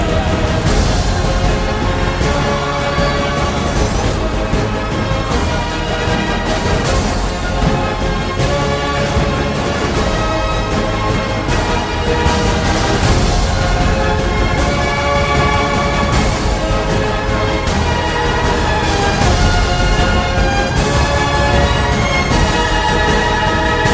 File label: Heroic March